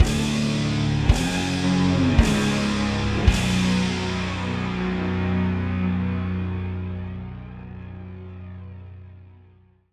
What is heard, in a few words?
GTR